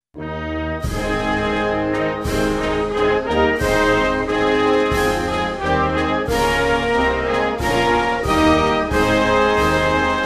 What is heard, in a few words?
Albania national anthem